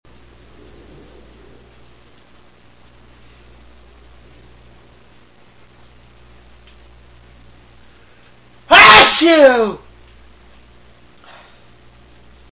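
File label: major sneeze, male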